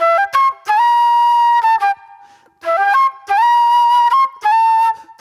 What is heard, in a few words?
Soul-Jazz Flute, Call And Response; Sonic Foundry (Sony Creative Software); Manhattan Flutes